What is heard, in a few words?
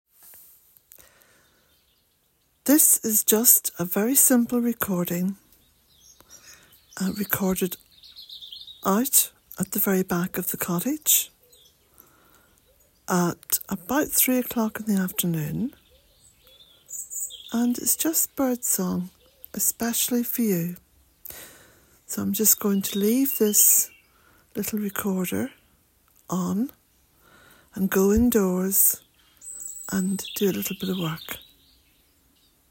Birdsong at Bealtaine